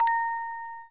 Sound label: orgel